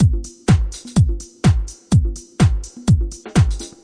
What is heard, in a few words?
straight heady house, drum loops and breaks